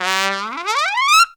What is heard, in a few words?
TRUMPET FX, Phantom Horns; Zero-G